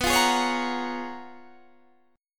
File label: Db 13th B, guitar